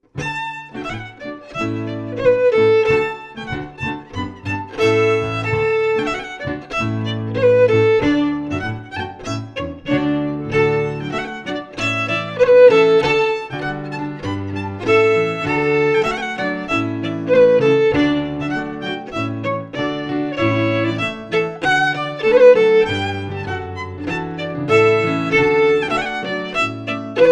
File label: FIDDLE PIANO; Jink & Diddle Book o' Tunes 2021